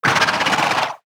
death; Barotrauma; Hammerhead

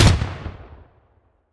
auto Shotgun; Security; WEAPONS

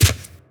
Footstep Mudraptor; Footsteps, Monsters

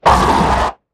attack; Barotrauma; Crawlerbroodmother

